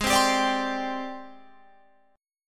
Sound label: G sharp Major 7th Flat 5th Guitar Open F; G#M7b5 Chord